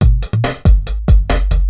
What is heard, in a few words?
DRUM LOOP BIG BT 140; eJay; BigBeat